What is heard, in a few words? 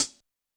Rock Cls, HiHat, Yamaha DTXpress-II